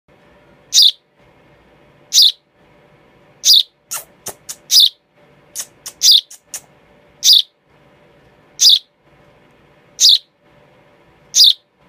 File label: baby sparrow sound